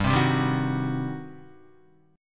G Major 7th Suspended 4th Sharp 5th, Guitar; GM7sus4#5 strummed; 2,3,0,0,3,2

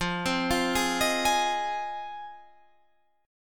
F Minor 7th F Guitar Standard; Fm7; 13,15,15,13,16,16; arpeggiated; capo=2

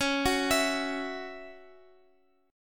Db Minor Double Flat 5th, Guitar Open F, chord; arpeggiated; Dbmbb5; JGuitar

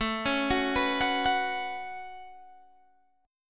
A 6th Add 9th A down Guitar Open G

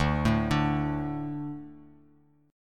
D 5th D, down; D5 arpeggiated; JGuitar

